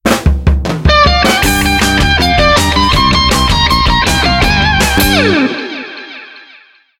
Mission Complete

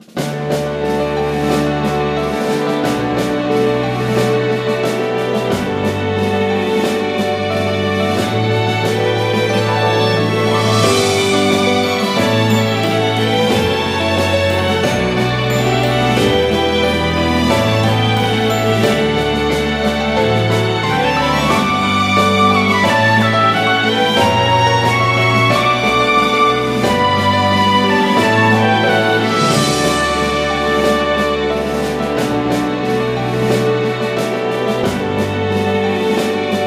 Music event

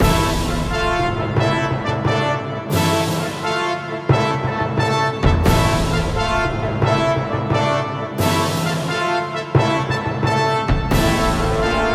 Battle; Soldiers Heroes of World War II; Russian Battle

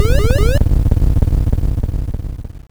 Start; Battlezone

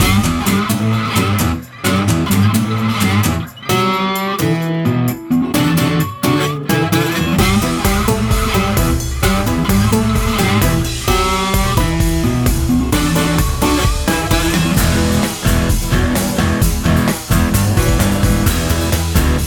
loop fight song; Western

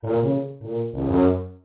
Tuba Missed note; Levels Hub Diner; Light Buford

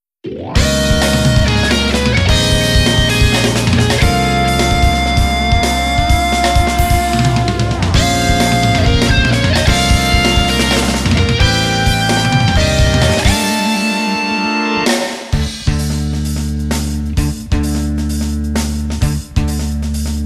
Minuet (Menuet); Taiko no Tatsujin Portable 2; PSP

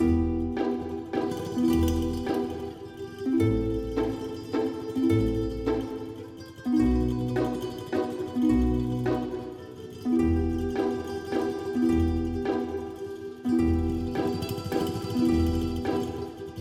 Guitar Tremolo; Tintin Reporter: Cigars of the Pharaoh

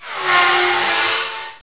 Groan

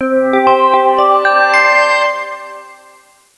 Sfx Gift

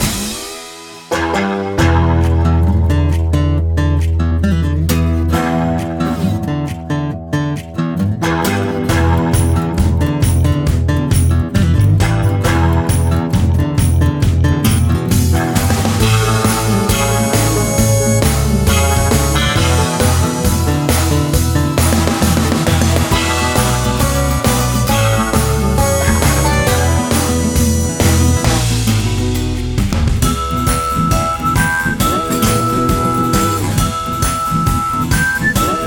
LOOP multiball; Western

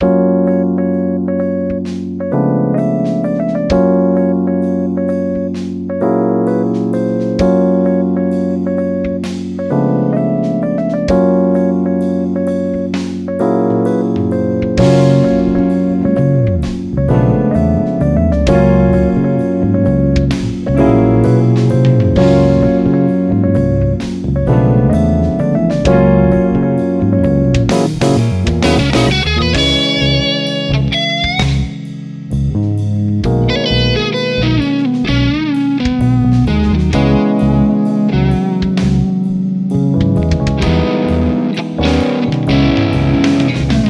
you like jazz; Super Holobunnies Pause Caf

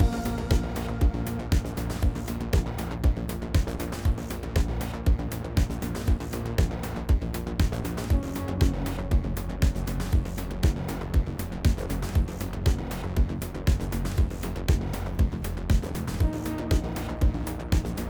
Combat 8CH 118 5BPM

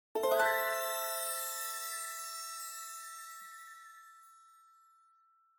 Object Found; tintin reporter cigars of the pharaoh

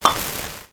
Bead Pickup; Swamp

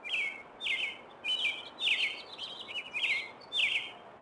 Intro Street; Levels Hub Restaurant; Intro Street Bird

